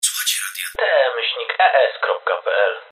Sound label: jingle